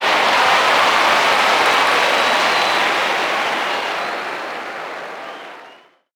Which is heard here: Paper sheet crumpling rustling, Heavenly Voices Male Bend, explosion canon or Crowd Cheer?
Crowd Cheer